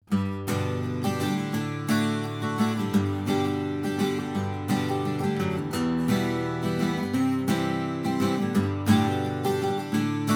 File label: 12 strum raw, guitar